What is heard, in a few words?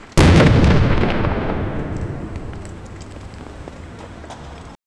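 three cinematic explosions, city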